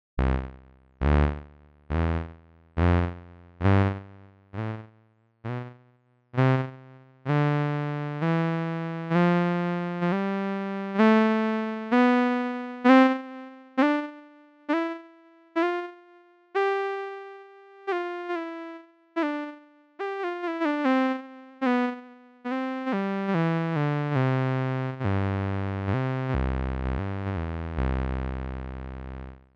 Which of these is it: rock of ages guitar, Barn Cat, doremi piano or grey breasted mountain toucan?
doremi piano